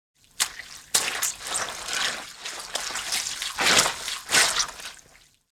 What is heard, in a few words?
Paint roller dipping into the paint can